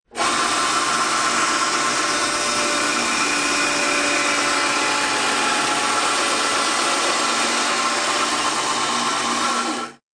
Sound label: Beeps; 헐리웃특수효과음; 신호-경적